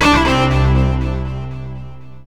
16-bit Slang Beep

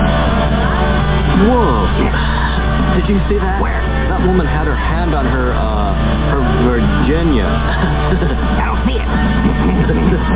HAHEM

